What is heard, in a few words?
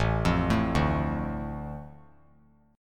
A Suspended 4th Sharp 5th; Asus4#5 arpeggiated; JGuitar